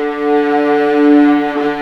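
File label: viola D3, ARCO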